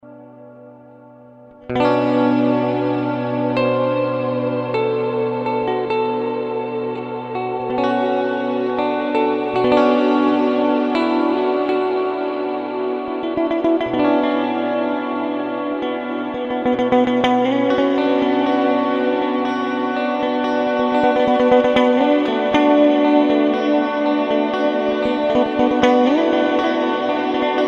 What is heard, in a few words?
Immerse MKII Plate